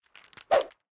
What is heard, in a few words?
logo Up; wild wild west